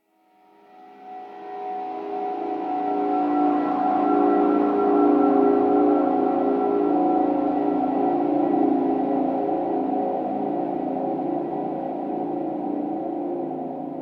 Railjack Exterior Texture; Warframe

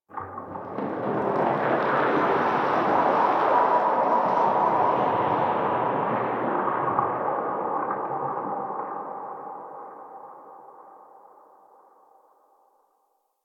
Rail Jack Exterior Earth Space Wind; Ambience; Earth Region

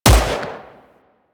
AI Rifle Shot; Warframe; Weapons; Corpus; Crp Ruban Rifle

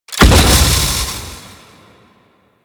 Movement Jump Land; Enemies; Warframe; Kela De Thaym

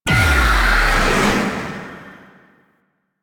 Unhallowed Cast; Warframe; Warframes; Dagath

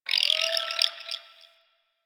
Drifter Camp Rock Critter, Creatures, Ambience; One Offs; Warframe; Quests; The New War

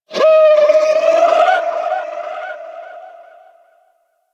Vocal Lift Away; Warframe; Hunting Critters; Orokin Kubrow

